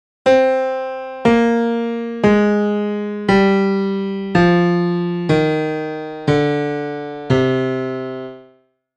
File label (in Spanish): Escala menor melodica descendente